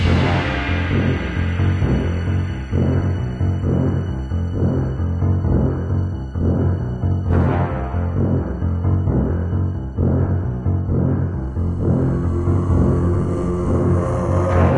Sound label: Bgm; friday the 13th killer puzzle